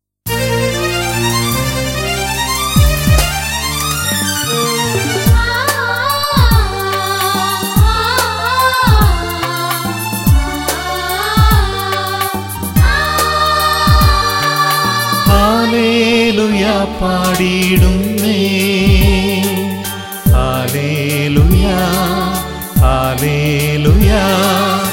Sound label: halleluiah